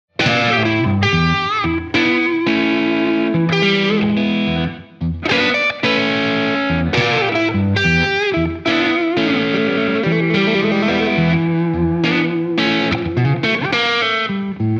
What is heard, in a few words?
blues tele sgear2; scuffham